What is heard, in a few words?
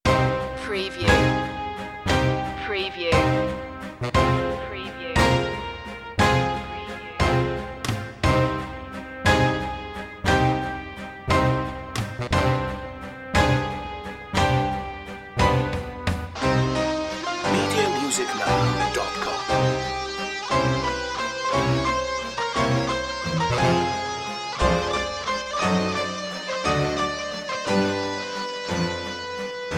electric guitar waltz